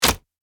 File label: Shooter fps game menu ui select